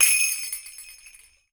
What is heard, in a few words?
SLEIGH; InVision Interactive